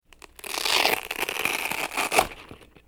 velcro rip

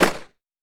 9mm single shot

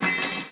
sword drop

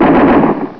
SEMI AUT

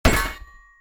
metal plate hit

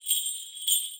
sleigh bells

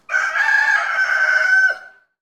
rooster

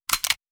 glock reload

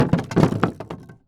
heavy wood plank

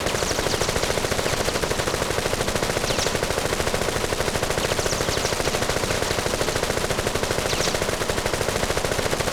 submachine gun fire

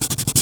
drawing fast

